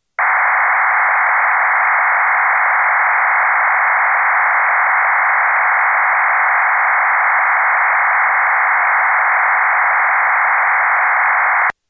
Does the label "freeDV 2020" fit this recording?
Yes